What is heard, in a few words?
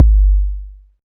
big bass drum sound g key, Royality free kickdrum tuned to the G note. Loudest frequency: 83Hz